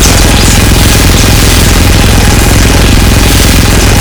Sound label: snail gun shoot crit; tf2